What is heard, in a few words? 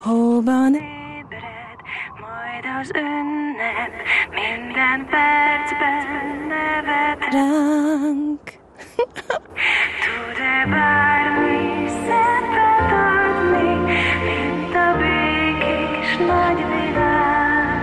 rock jingle; ringtones